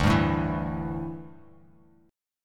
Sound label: D Suspended 2nd Suspended 4th D down; strummed